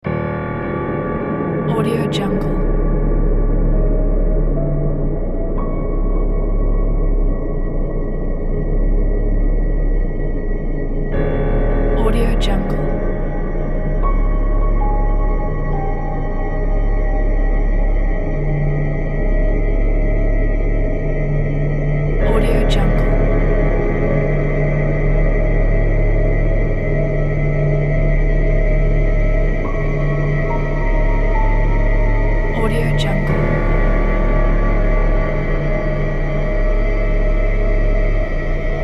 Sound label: dark ambient horror